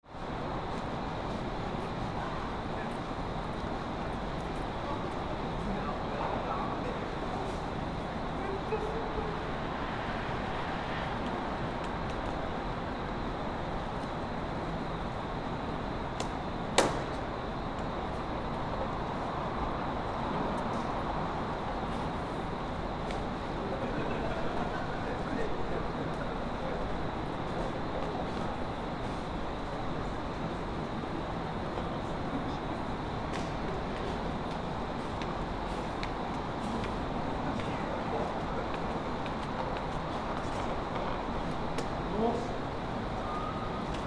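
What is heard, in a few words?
candlenight, Fukushima Soundscape; ekimae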